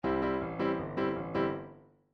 chord lines twist, midi

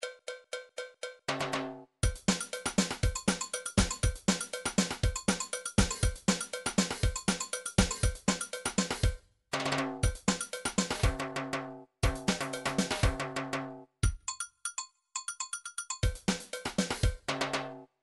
midi drums, rhumba

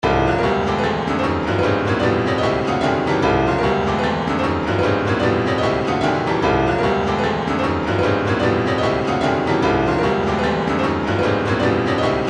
retro funk, midi; krush

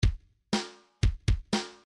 rock kick snare 1 bar

